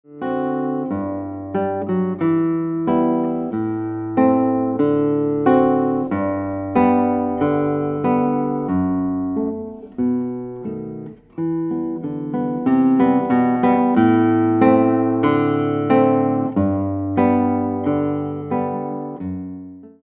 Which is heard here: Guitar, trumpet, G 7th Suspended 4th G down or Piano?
Guitar